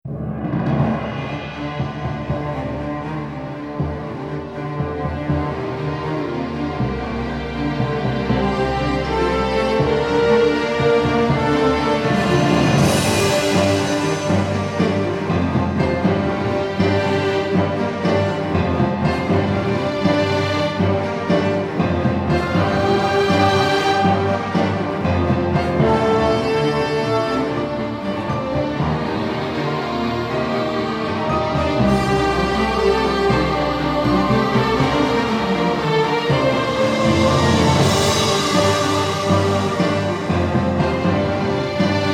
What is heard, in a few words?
bomb planted; halo